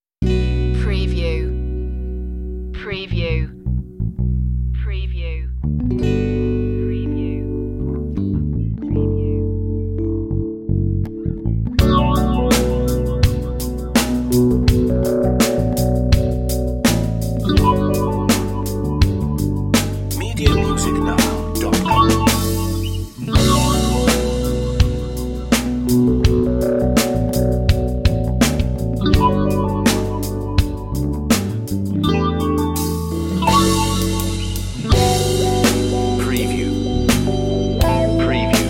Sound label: life grooves